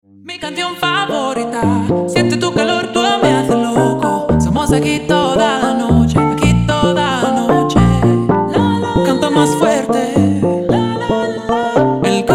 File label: slowed